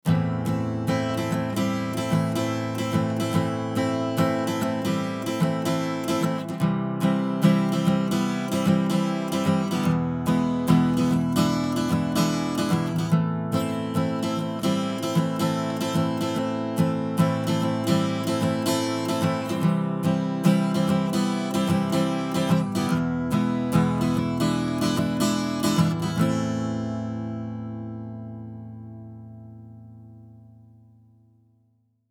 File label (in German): SSL UltraViolet Gitarre ohne EQ